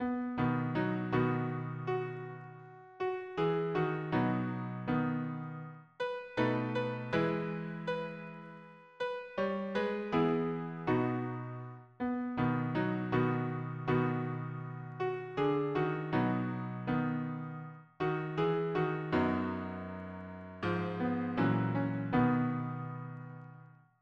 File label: harmony piano